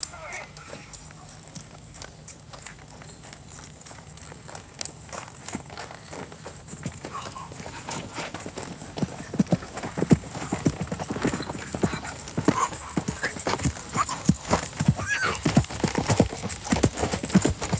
horse walking with creaking leather saddle; footsteps

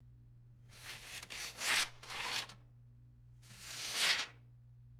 stiff paper tube sliding slowly

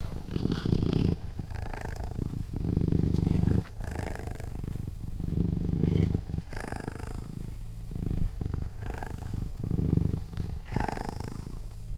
throaty guttural creature purring; creatures

